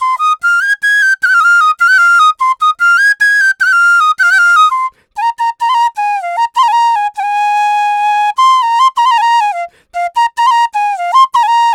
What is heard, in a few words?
Flute Loop G Sus 100 BPM; Gully Loops; waves of bihu vol 1